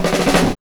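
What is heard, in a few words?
snare drum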